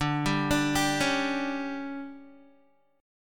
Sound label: D Major 7th Suspended 4th, Guitar; DM7sus4 arpeggiated